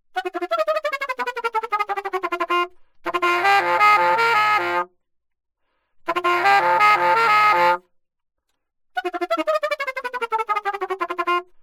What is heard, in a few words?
altosax